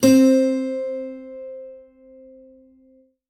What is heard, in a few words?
tropical guitar C3; Evolution Of Sound; Tropical Revolution